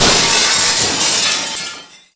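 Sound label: glass small; TrickStyle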